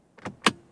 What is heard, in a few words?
plastic Latch; SGI O2 Out of Box Experience 2.2; systour